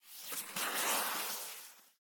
Marker Word; miside demo windows gamerip 2023

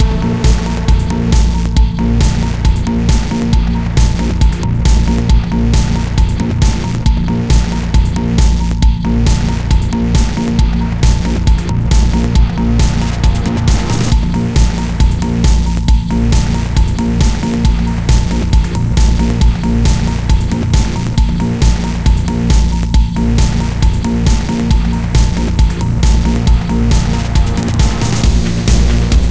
Combat; Kate; mirror's edge gamerip

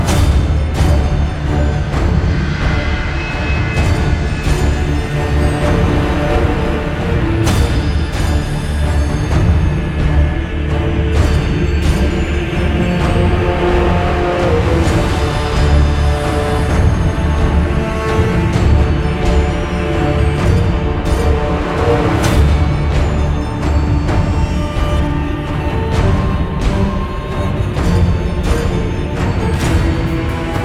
Fire Battle Loop; battle breakers; android ios macos mobile online windows

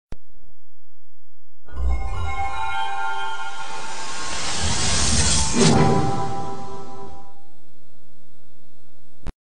Startup; Sega Saturn; PAL Version

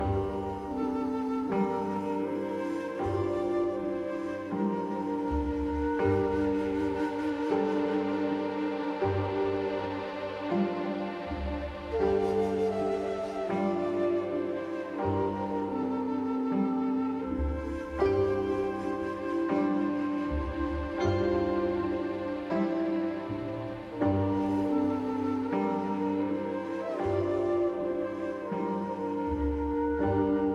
forest loop; Lost God; forest loopCont

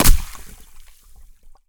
stomp thin ice; Scrat

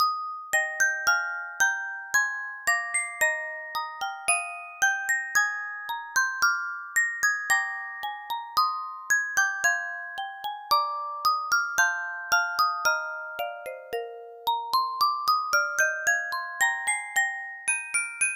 Music Box; Hat Shop

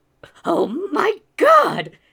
Male Reactions; a dump in the dark

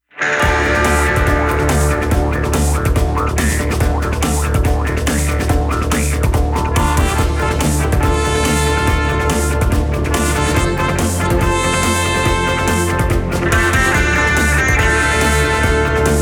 Level Battle Loop; running gods

